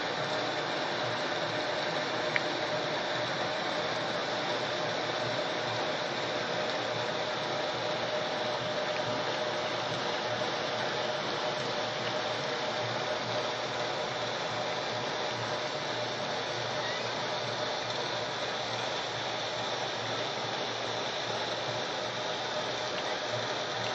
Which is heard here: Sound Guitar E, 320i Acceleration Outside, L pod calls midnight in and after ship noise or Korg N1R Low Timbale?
L pod calls midnight in and after ship noise